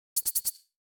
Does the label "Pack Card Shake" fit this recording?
Yes